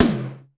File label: Mega Drums, Korg 01W